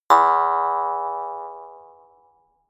mouth harp

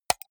computer mouse right click fast hard

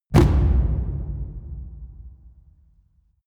drum mid loose

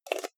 jar plastic midsize close fast

